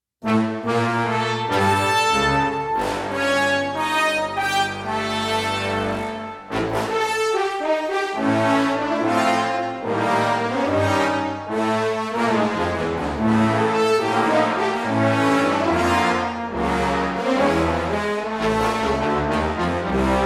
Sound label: brass only, brass; Virtual Orchestration